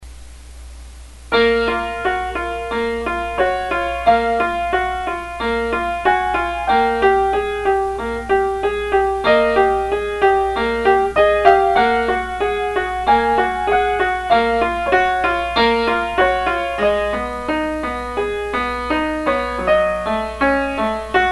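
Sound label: crying sorrow piano; ff4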